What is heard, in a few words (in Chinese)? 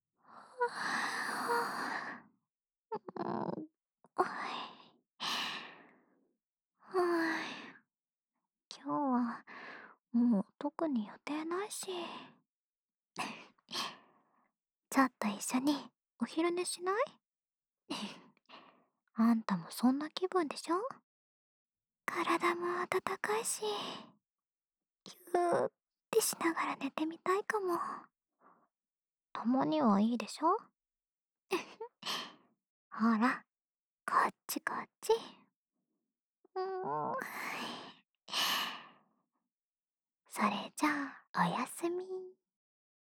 安眠用紧贴陪睡（右耳）, ASMR; 成为猫的ASMR; 吾辈的耳朵是很敏感的！